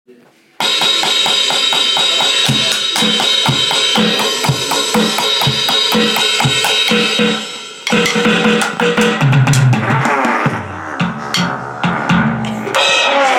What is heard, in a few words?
drum composition